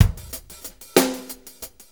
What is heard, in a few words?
6-8 Loop, Full Kit Loops; Sonic Foundry; Loops & Samples Drum Tools